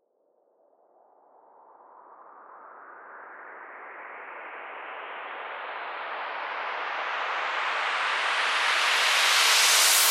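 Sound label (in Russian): вжух шумный спокойный мягкий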